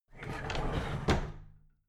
drawer big squeak close regular

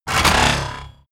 sci-fi electromechanics expand evil

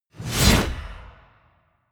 sickle cut

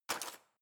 knife wood drawer grab hard

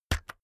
keyboard external key hit fast

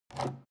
canister empty pick up

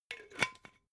glass bottle massive close cap

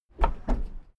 car lift down short

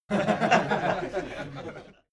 small male group laughing

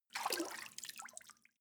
step water sneak out

